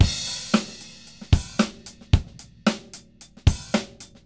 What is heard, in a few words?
DRUMS LOOPS